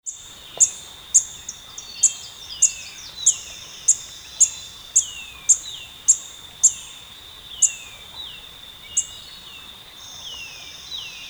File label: Colibri coruscans